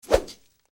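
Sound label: Medium Speed Air Punch, whooshes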